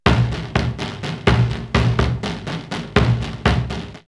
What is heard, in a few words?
Harare 124, drumloops World Ethnic; X-Static Goldmine